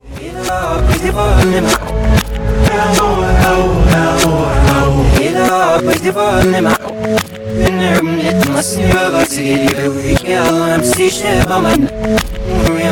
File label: song reverse